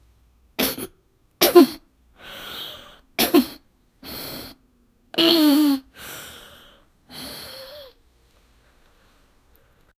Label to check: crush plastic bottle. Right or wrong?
Wrong